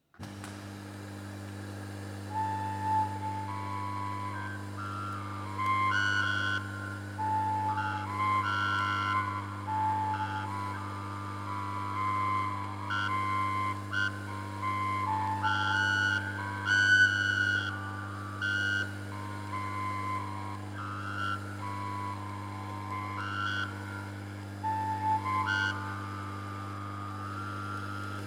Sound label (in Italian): organo voce